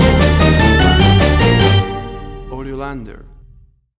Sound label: Big Bad Castle Boss Stinger